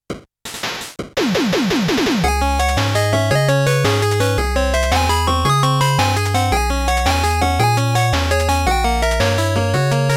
Shop Blues

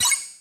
Meteor Tap; disney star smash; android

